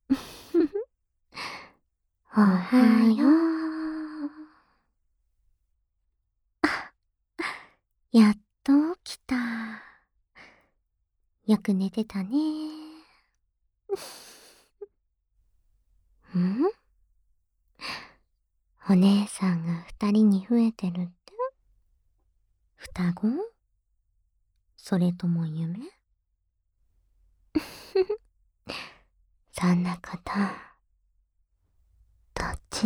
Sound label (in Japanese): ダブル囁き耳舐め; ドスケベおねーさんがボク専用の種付中出し肉便器オナホに立候補してくれた件♪